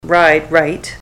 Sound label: ride right